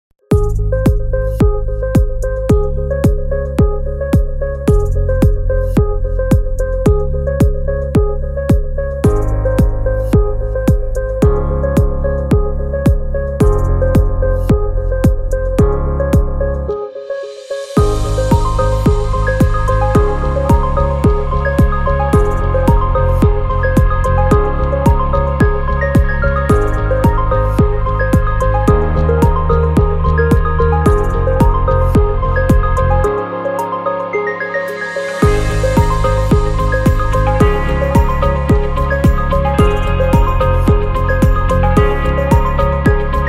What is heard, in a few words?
Ambient Technology